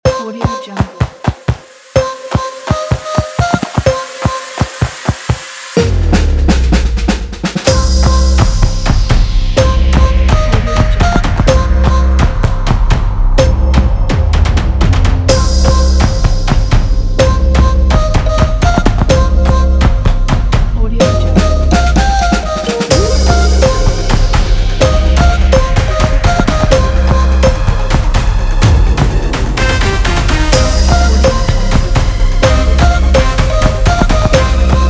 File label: stomp quirky; onymusic